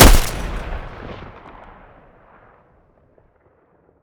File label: mg muzzle mono, weapons stationar